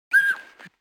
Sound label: squeal